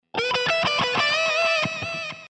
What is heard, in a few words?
Cool Guitar Sms